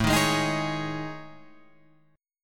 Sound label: A Major 7th Flat 5th; chord